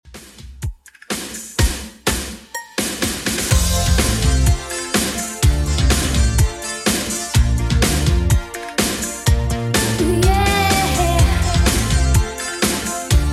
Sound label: 80s Redrum, 125 bpm